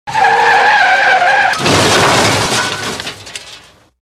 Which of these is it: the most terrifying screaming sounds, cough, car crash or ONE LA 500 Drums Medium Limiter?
car crash